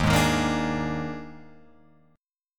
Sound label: Eb Major 7th Suspended 4th Eb, Guitar, Open F; strummed; EbM7sus4